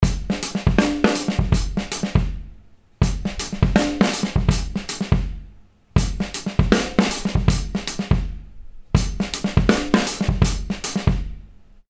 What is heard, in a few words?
drum loop 81bpm